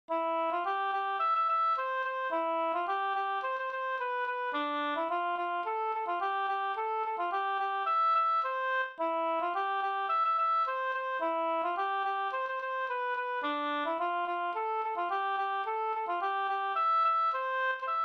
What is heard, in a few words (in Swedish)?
polka, C dur